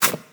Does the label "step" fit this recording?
Yes